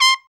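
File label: BRA TRUMP, trumpet, brass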